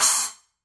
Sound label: 10 splash choke; signature precision